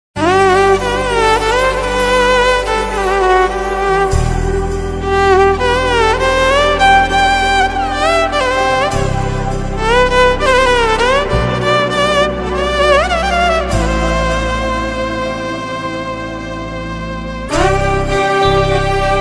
romantic ringtone love ringtone south indian ringtone